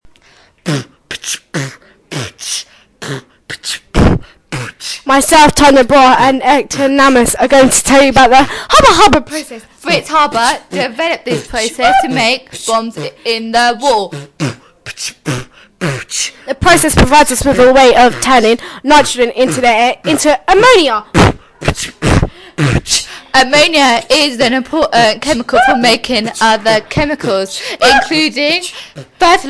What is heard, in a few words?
haber process rap